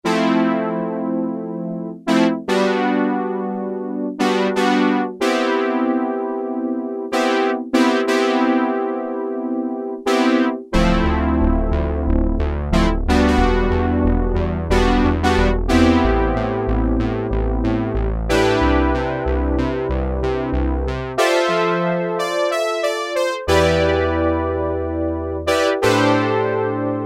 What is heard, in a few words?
Brass, Roland Juno-106; Factory Preset